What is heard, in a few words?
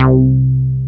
MOOG; Rubber Chicken Software Sample Bank